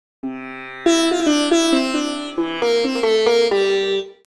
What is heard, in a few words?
sitar